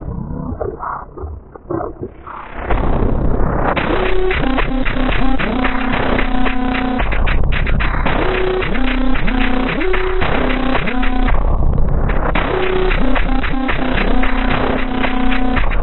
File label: pitch down Pink; Pitch Shift Down